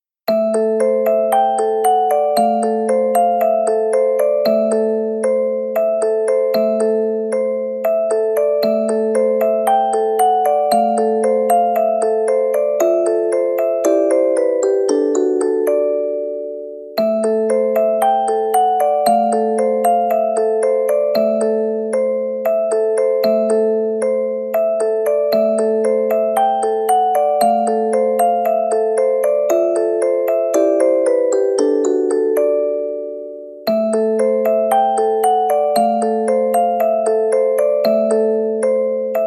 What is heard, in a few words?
Music Box